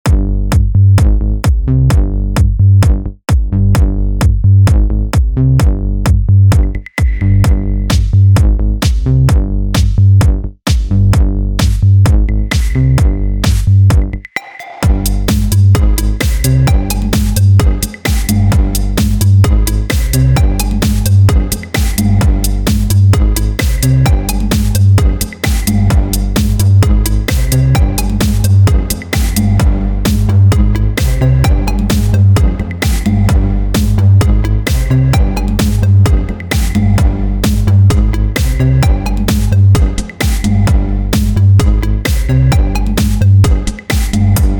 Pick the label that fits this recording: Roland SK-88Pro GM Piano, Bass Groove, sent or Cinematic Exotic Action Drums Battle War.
Bass Groove